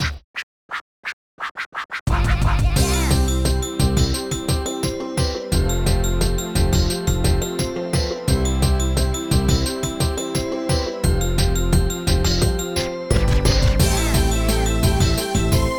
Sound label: STAGE SELECT, BPM 87; Scribblenauts